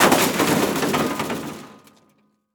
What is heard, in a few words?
Crash DVD Player Throwing Down Stairs; Crashes